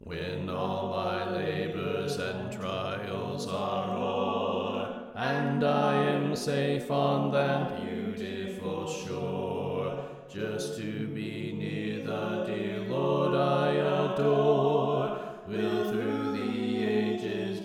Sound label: Bass Left